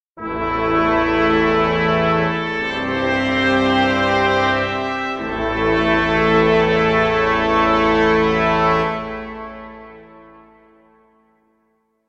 zvuki s effektom zaversheniia, okonchaniia

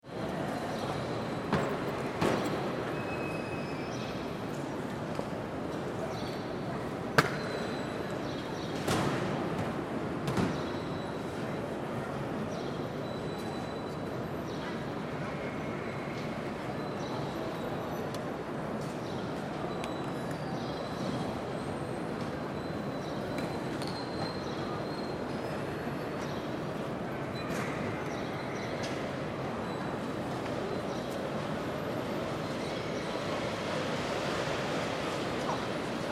zvuki bagazhnoi karuseli v aeroportu

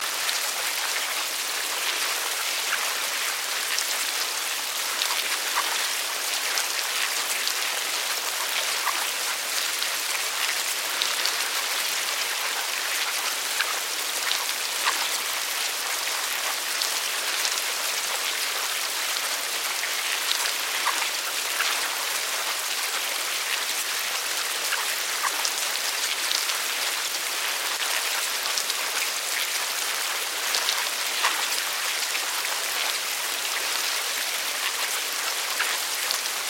zvuki cherviakov

